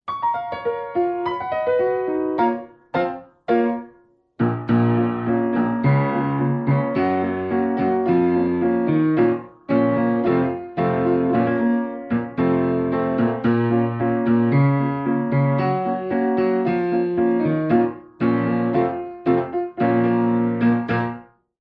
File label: instrumental